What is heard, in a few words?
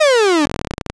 fall